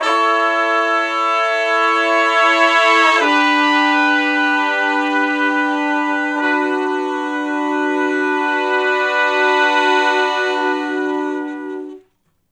Slo Minor Blus Chords (V,IV,I,I) (B), Horncraft; Sonic Foundry (Sony Creative Software); Sections

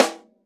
SD1 -L, Drums Acoustic; AKAI; 90s sample CD; USB Soundscan vol.10; RAW KIT 6

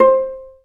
Vla Piz C3; Multisamples; Digital Sound Factory Virtuoso

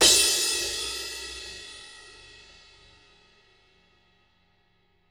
CRASH; Sonic Reality; Interactive Drum Kits; 90s sample cds

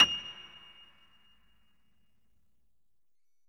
MONO, Acoustic Piano; InVision Interactive; Miroslav Vitous

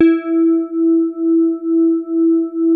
SOFT E3, synth pluck; Music Store - Claudius Diemeter Schwerlos

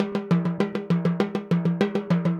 Timbali, Latin Percussion; Sonic Foundry; Joe Vitale Latin Percussion

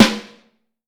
Vintage Snare Drum; Q Up Arts; Johnny.C's Vintage Snare Drum; 5X14

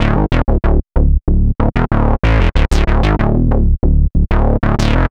Synth Bass; Sonic Foundry; Leo Cavallo Bass Taster

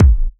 Kick, Kickdrum; Equipped Music - Loungin House

